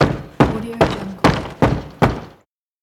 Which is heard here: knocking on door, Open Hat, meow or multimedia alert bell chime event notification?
knocking on door